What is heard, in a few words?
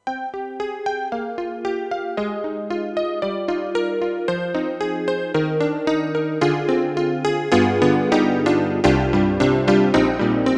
Yamaha MU500 Det. CP80